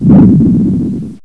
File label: explosion; RocketPatrolMods